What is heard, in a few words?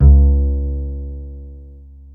PX ACOUS.C#2, Bass; Masterbits CLIMAX 7; PX BASSES